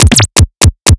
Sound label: Needle Shots; Plastic Surgery